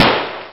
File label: Grenade; star wars; juegos python